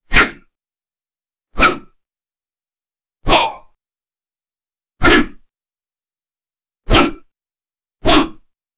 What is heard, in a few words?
background noise sword